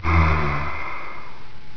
pain

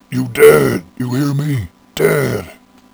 alert; hassault

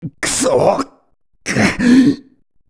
death; piccolo

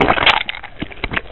body splat classic

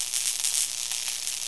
buzz, electric

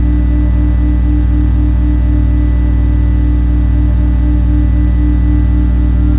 walldevice

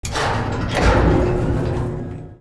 elevator start move; Genoserv

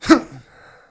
Swing

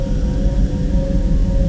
alien harmonics

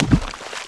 sewage large splash

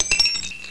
m2 assault rifle casing

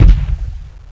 plasma blast

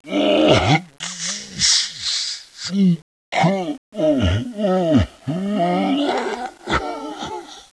death

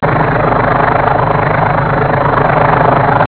plane

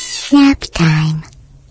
retract, portal turret; scmod

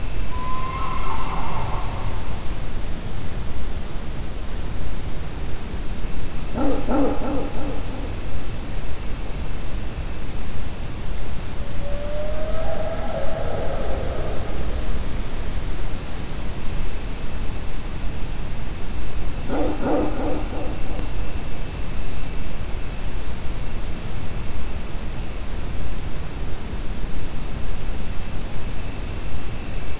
hell forest; ambience

